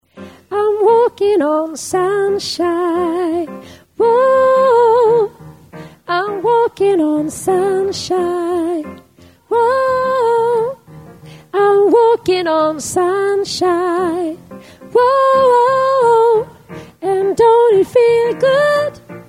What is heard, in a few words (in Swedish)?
sopran